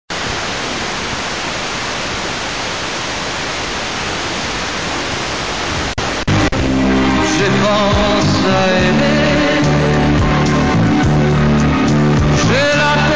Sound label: 85 110 MHz